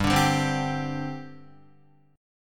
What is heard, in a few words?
G Suspended 4th, Guitar, Gsus4 chord; strummed; JGuitar